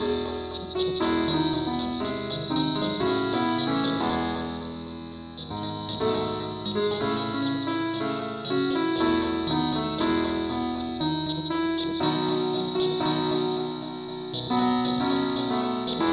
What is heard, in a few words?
keyboard only key e